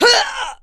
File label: male death